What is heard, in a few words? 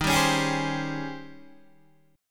D sharp 13th, Guitar, DADGAD